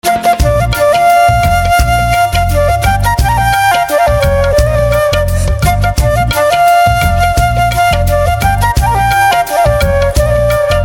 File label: Flute Instrumental Ringtone